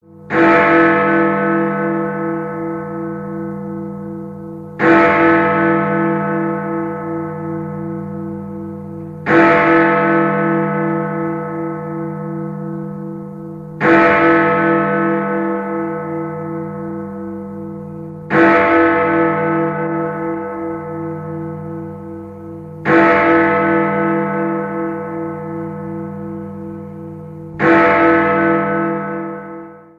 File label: big ben sound ringtone